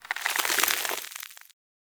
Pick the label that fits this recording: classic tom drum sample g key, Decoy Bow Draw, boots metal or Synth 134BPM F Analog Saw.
Decoy Bow Draw